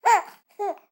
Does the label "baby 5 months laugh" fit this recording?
Yes